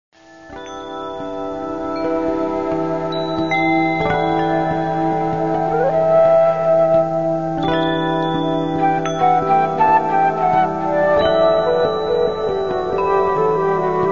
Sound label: Bells